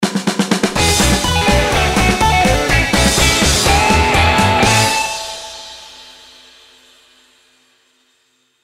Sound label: win mega; Wacky Waves